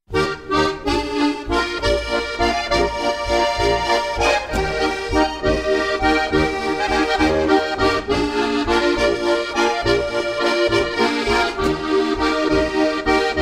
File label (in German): Hamonika Drio Gurktalerwalzer